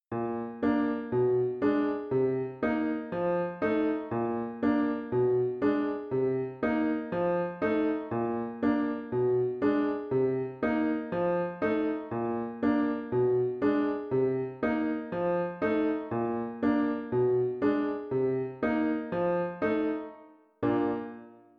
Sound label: a walk in the park accompaniment; elementary solos accompaniments